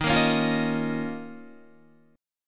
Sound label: Eb 7th Suspended 2nd, Guitar; 4E,4A,5D,5G,5C,6F; strummed; 11,13,11,10,10,10